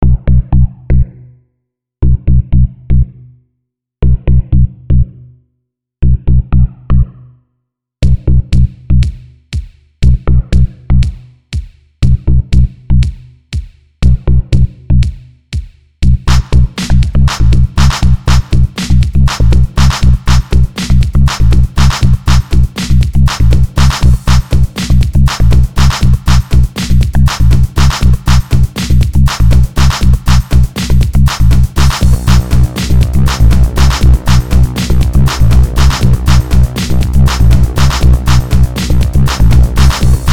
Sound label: drums